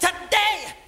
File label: today, SUNG